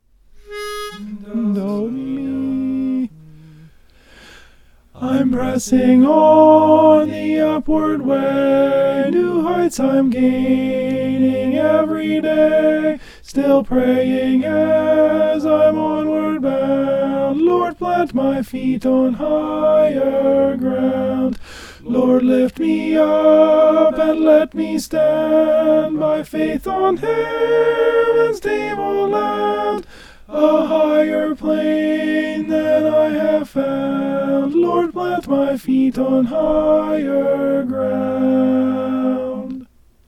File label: alto